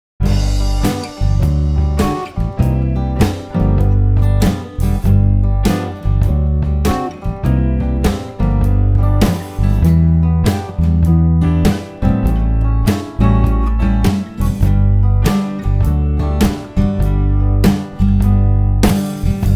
instrumental

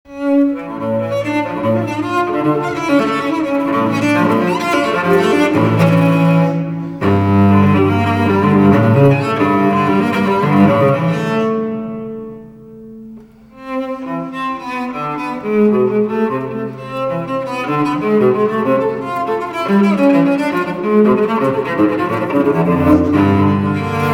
FIRST MOVEMENT

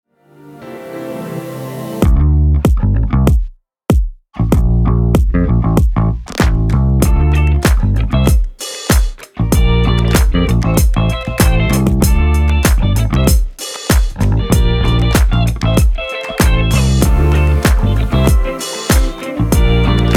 (INSTRUMENTAL)

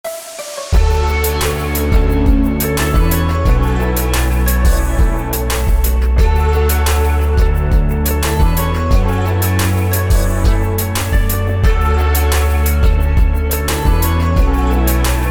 NEW SYNTH EMPIRE

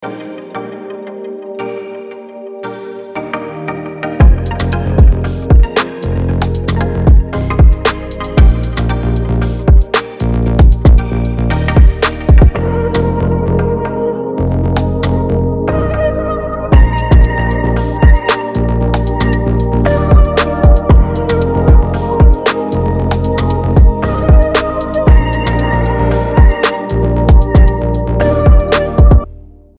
ELECTRIC RETRO, afro house

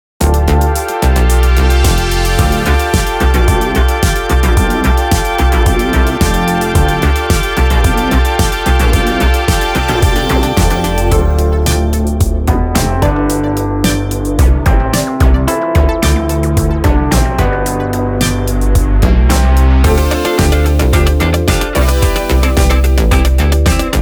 (INSTRUMENTAL)

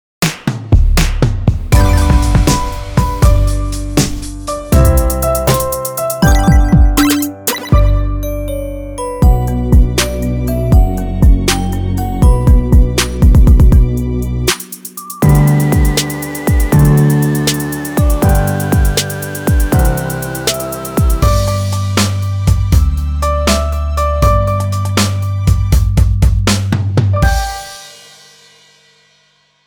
CLUB

